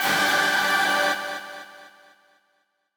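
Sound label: b stab1 a, note G octave 5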